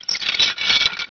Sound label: Sqeaking Pully